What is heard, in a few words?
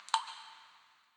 Ambience drip; adams venture origins